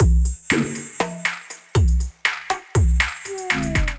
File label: Jive Kit, drums; Ableton; Factory Packs; Skitter and Step